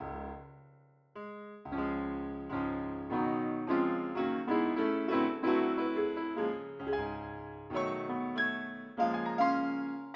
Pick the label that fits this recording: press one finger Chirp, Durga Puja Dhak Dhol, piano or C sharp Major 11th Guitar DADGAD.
piano